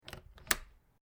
WINDOW ALUMINIUM SLIDING SMALL CATCH LOCK; Household; Windows

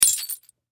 Glass Debris Drop, Glass Drop Single Piece; Foley; Objects; Blastwave

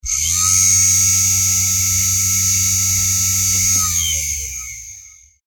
Science Fiction Servo Motor; Spacecrafts

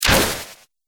Laser Cannon Gun

